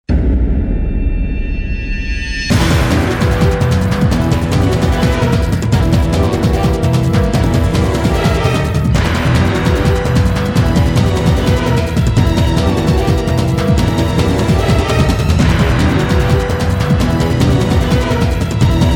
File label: Combat; darkstar one; windows xbox 360